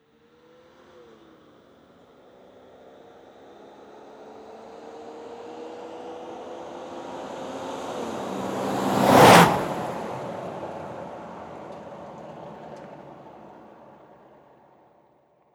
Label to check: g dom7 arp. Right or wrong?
Wrong